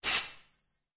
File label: Sword